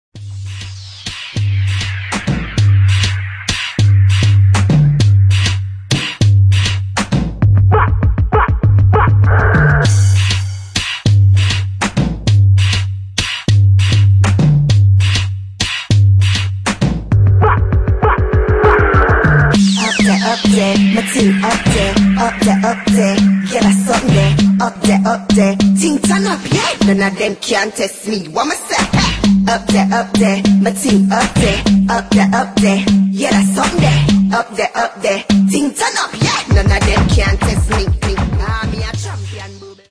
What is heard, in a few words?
riddim